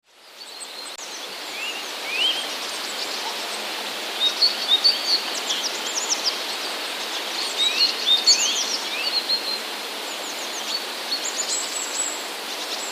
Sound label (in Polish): kowalik jesienny; który ma postać rosnących gwizdów. Oprócz kowalika na nagraniu śpiewa bogatka